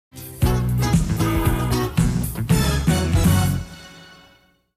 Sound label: Jingle, radio